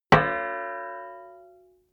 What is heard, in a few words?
plate hit ring stopped; hits impacts